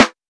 dope snare a sharp key, acoustic snare sound tuned to the A# note. Loudest frequency: 1588Hz; snare drum samples